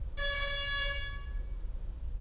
party horn

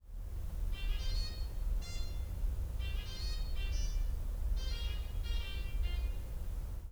musette

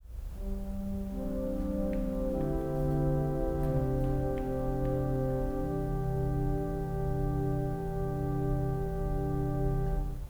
flute celeste

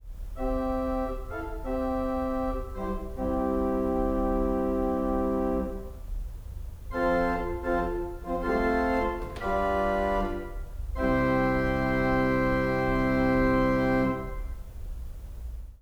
horn diapason

